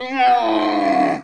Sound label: moo; giants